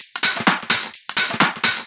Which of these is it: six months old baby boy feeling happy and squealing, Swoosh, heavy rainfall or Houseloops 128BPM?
Houseloops 128BPM